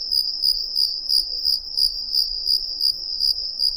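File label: cricket ambience, swamps; ambient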